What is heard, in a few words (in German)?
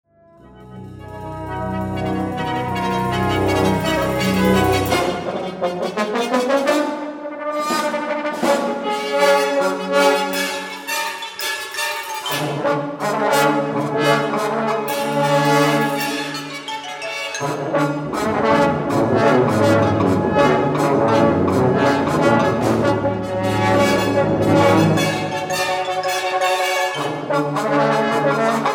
Kirchenmusik; BB Fluehli